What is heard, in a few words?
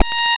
attack; u1-dos-sounds